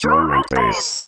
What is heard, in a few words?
VOC, 160 BPM, Drum & Bass; Ueberschall; Drum & Bass Flash Trackz